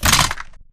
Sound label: Damaged Torso scrape Concrete